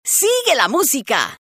jazz; rocktopus

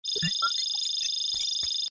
Scanning

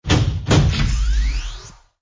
Pressure Seal

